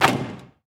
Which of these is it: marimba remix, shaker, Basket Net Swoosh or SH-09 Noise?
Basket Net Swoosh